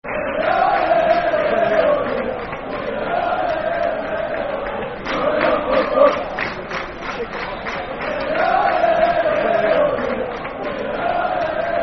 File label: Football Chant, Soccer Song; Going Up, Up, Up; Peterborough United Pufc